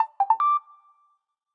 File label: Message